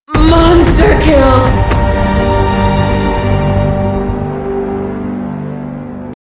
music stinger; killstreak music; UT2K4

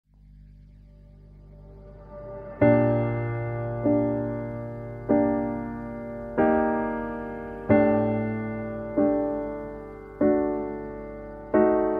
music core exposed lp; Risk of Rain music pack; in-game music

